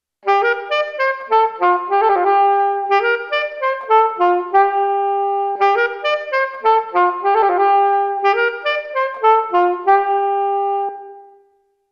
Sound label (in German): Sony DRE 2000 Saxophon Metropolitan Opera House New York